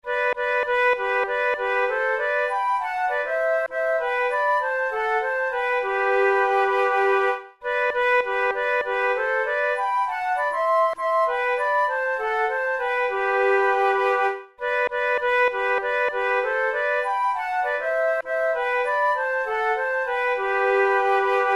duet, flute

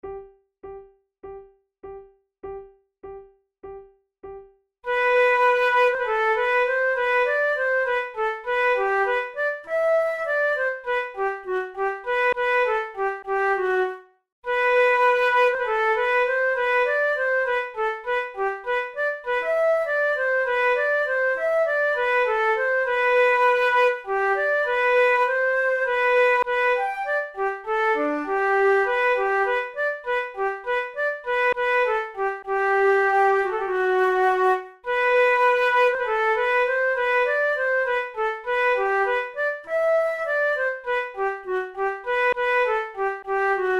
duet, flute

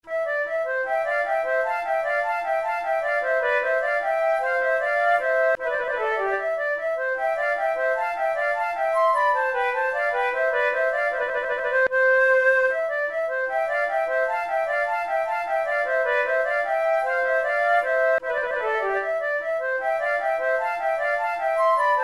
musette, flute; Naudot; 6 babioles

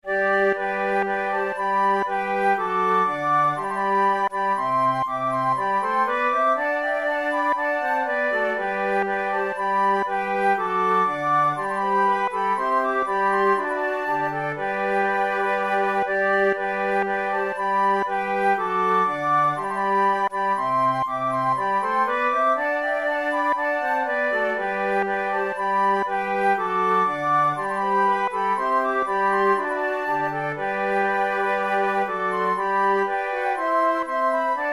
te deum choir, flute; charpentier

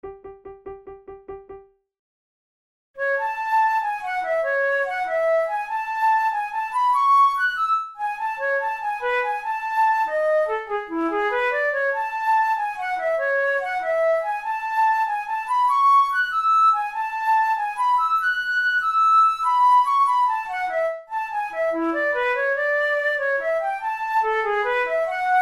duet in a major; kohler op93 no2 duet no8

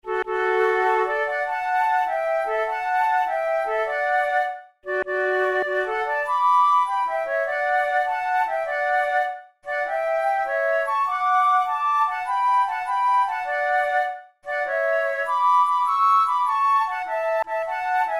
duet

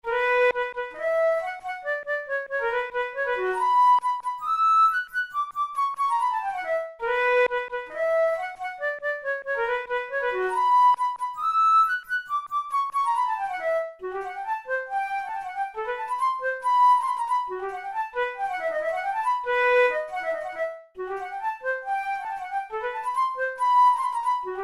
hungarian dance, flute; brahms; hungarian dance no21

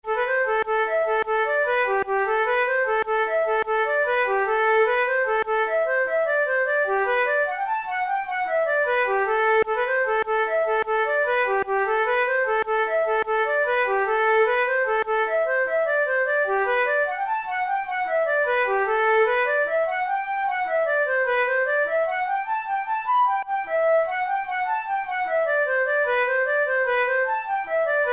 jig

